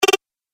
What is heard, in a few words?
Multimedia Triple Button; Button Sound Effects; Business Programs, Apps And Computing Noises; Andrew Fleming